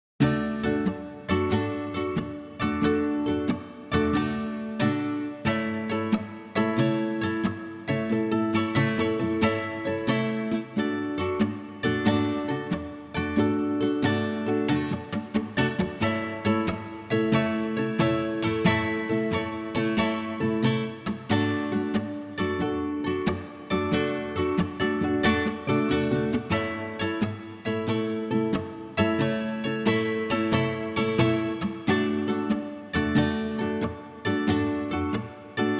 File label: gitarro; Muzyka Ilustracyjna